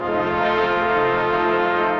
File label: settle victory; Embalming Girl